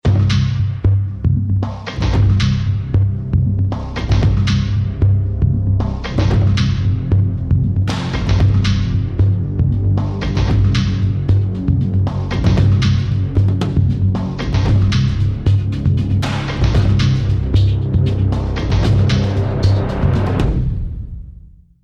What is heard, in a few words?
choose team